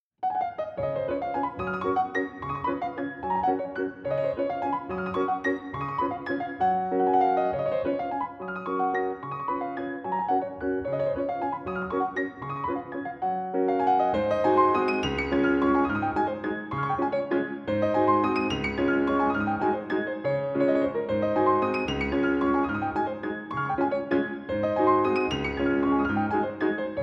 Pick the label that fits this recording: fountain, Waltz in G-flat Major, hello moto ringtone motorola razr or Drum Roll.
Waltz in G-flat Major